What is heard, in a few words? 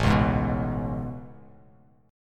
A Major 9th A, Bass Standard; AM9; strummed; JGuitar